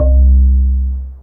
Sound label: Bass Mar C3, Mallets